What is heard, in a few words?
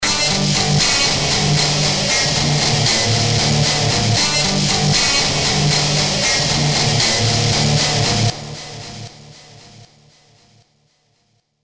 Hammer Guitar